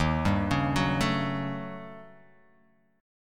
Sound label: Eb 7th Sharp 9th Flat 5th Eb, Guitar Open F; arpeggiated; 3,2,1,1,0,x